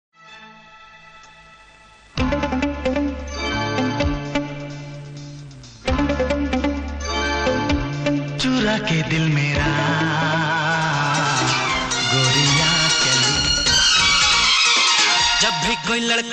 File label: bollywood ringtone